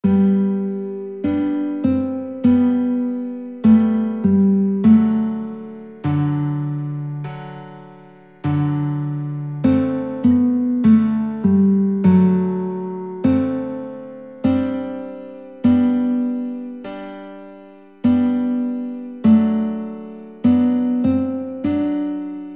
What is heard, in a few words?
guitar